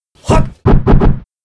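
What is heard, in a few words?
Mob attack; Monster; 욱일승천류; 부풍단주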